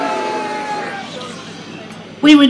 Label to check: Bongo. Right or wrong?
Wrong